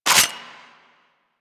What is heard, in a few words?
Heavy Shotgun Cock Stop; Weapons; Warframe; Tenno